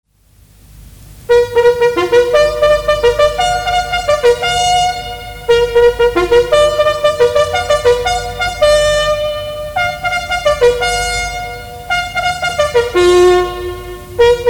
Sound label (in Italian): ritirata